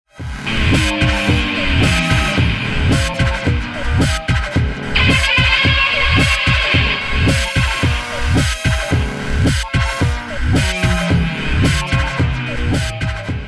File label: guitar